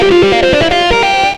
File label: xtra lead